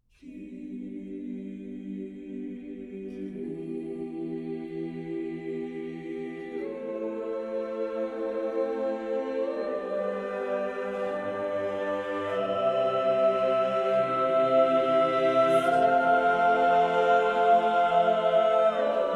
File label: Choir; Graham Ross; Requiem; Music for All Saints